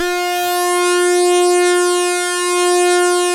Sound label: SAW RES DRY, SYNTH